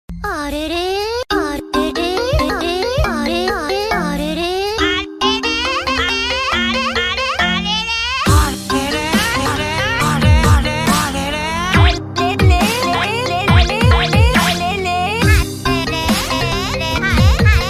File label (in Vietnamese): nhac chuong iphone remix; tham tu conan